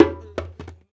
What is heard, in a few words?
KAKID, African Perc; Spectrasonics; Supreme Beats; African Contemporary; Afro DRMs